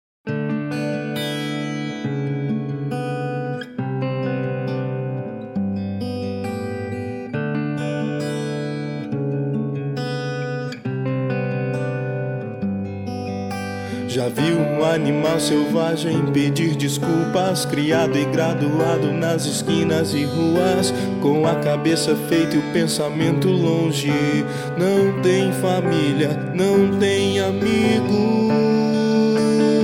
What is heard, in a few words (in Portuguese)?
vinheta